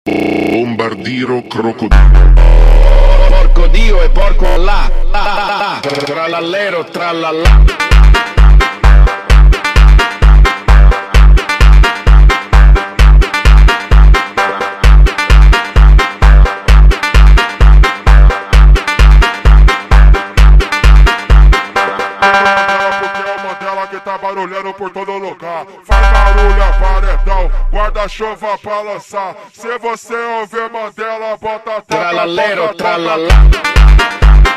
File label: montagem